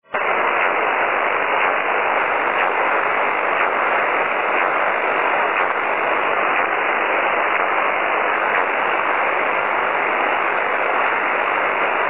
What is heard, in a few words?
Ionospheric Sounder or OTHR Radar